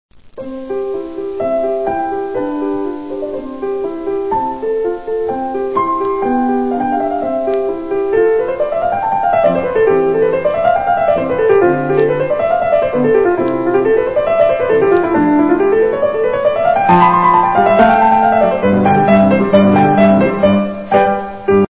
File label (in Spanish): sonatpia; FLAUTA MÁGICA